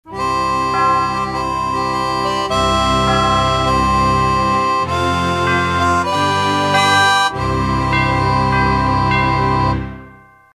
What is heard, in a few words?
victory; creepergulch